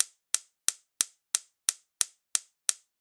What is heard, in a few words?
household cooker hob ignite sparks; zapsplat